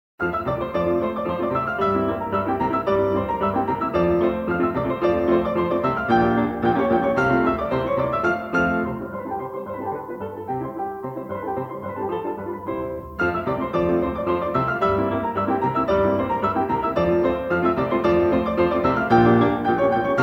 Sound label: polka; a berio